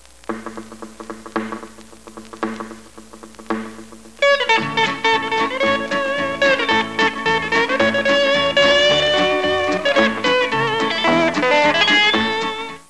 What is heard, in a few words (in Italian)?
suonerie musica ritmica; rhythm